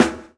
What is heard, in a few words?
SD ROOM, snares; E-Lab; X Static Goldmine 2